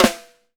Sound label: 6X12 ALUMNUM, Living Drums